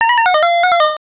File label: Miss; System 1; arcade